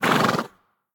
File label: mvt pet vocal; smite; lancelot